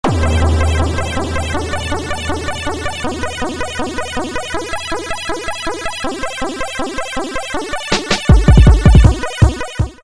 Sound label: Spaceshooters